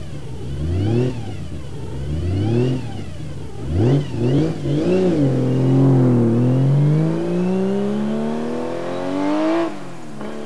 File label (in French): 935, Porsche, Démarrage / départ